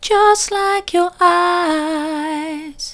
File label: soft, vocal